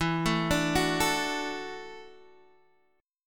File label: E 7th Suspended 2nd Suspended 4th, down, Guitar, Open D